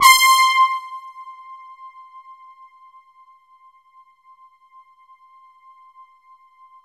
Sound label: poly brass; JV-1080; Syntec; Wall of Sounds